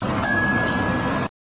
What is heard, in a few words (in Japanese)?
densya pinpon